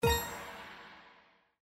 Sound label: Spin Stop Win; Chinese New Year Deluxe